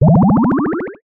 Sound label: multimedia game sound classic 1 up